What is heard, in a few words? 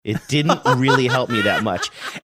laughing says it did really help me that much